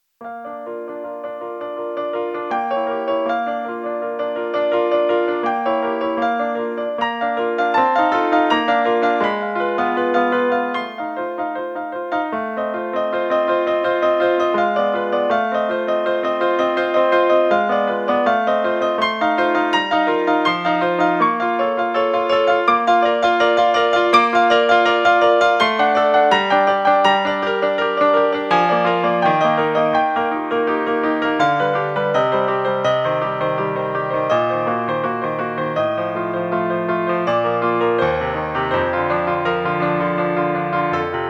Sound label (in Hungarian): B-dur etud; Czerny